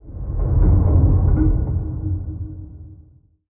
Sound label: submarines engines; World Of Warships